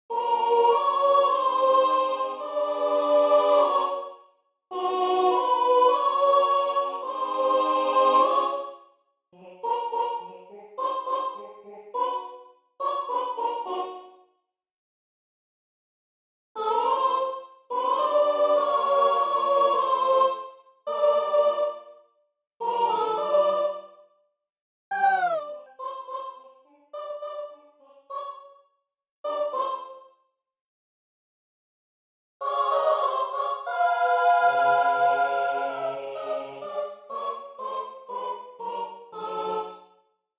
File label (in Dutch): Sopraan